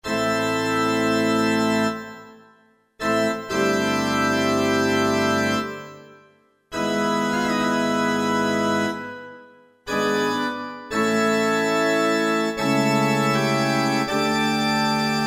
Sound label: Chrch Org, Yamaha YPT-400; Voices